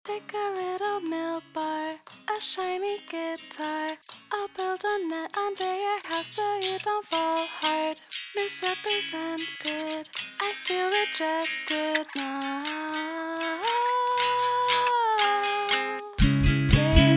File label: Shiny Guitar